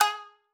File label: marimba shamisen; ゆめ2っき